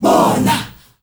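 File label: bawh nahh, group chant, Africa; Spectrasonics; Heart of Africa 1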